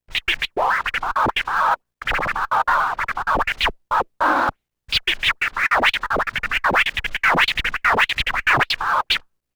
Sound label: Scratch; Matt Hoffman's Pro BMX 2; XBOX